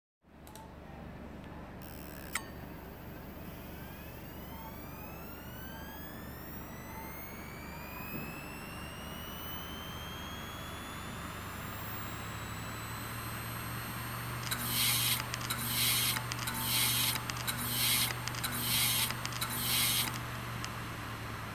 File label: HEADS surface HITACHI 3.5 1TB HDS721010KLA330, damaged hard drive sounds